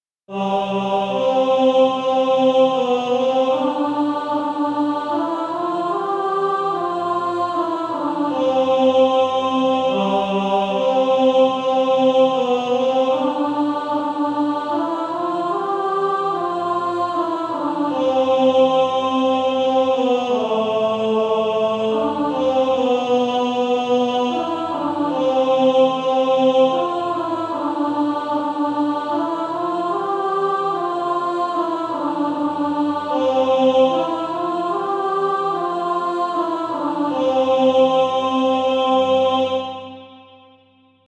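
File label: Male Choir, tenor 2; Infant Holy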